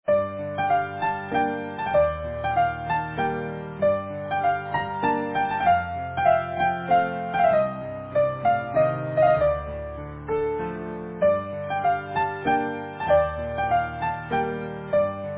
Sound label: Easy Piano